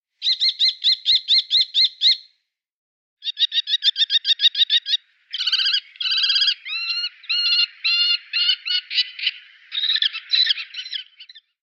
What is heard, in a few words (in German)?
turmfalke